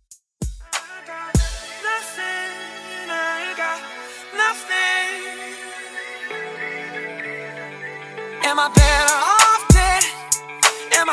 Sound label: Alternative Pop Rock Re Drum 97 BPM